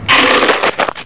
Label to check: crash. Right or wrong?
Right